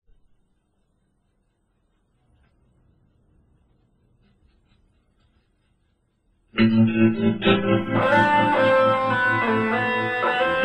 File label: Noise and reflections